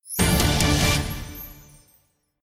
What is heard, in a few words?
EFX JACKPOT ANIM, SLOTS; Jurassic Jungle